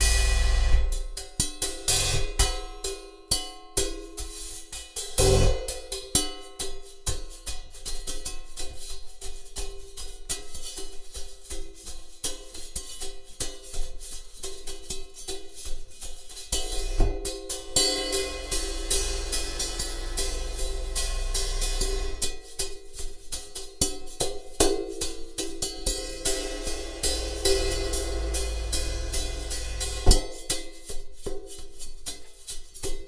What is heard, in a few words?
Cymbal; Percussion Samples